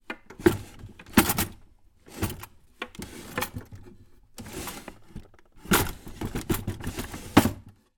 household cutlery draw unit open wooden draw cutlery rattle stiff; zapsplat